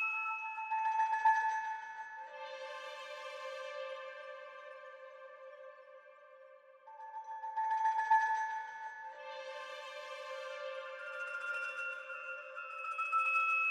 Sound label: 140 BPM A Min Texture; trap; Melodies; Loop Stems; Cymatics; Trap Starter Pack; Cymatics Gems Vol 1; Castles